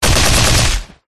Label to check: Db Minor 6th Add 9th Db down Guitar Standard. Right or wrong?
Wrong